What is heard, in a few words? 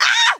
surprise